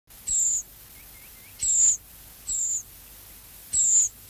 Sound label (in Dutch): koperwiek, trekroepjes; tijdens de vogeltrek